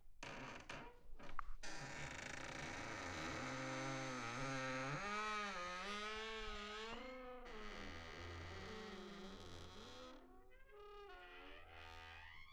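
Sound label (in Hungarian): ajtónyikorgás; zajosság szerint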